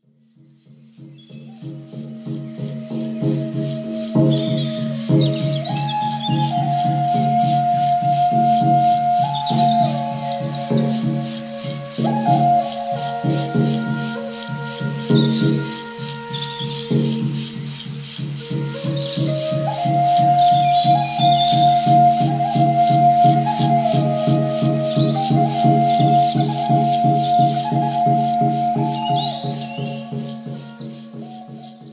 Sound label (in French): musique chamanique; chamanisme